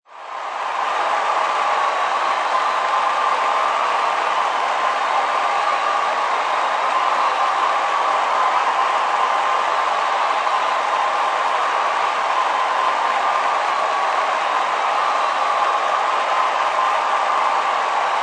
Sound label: Sports Stadium Crowd Cheer Applause Long Close; Crowd Sound Effects